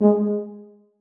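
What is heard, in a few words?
tuba staccato g2; Sonatina Symphonic Orchestra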